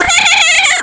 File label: Die; Kargaroc